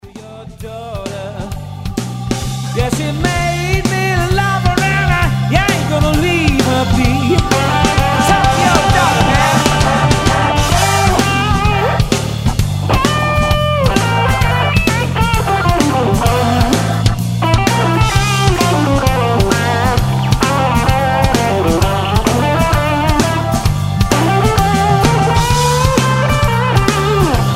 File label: solo